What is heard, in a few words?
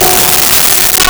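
Nylon String Guitar Gb5; Nintendo; July 2020 Gigaleak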